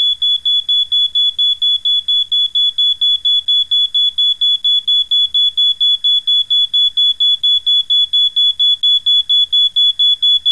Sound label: smoke alarm